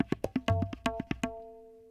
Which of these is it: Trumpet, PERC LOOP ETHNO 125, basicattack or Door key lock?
PERC LOOP ETHNO 125